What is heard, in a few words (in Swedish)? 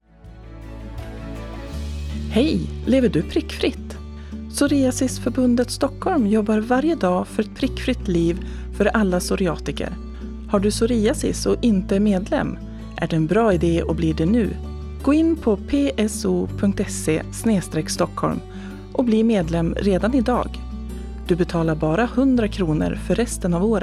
jingel; stockholm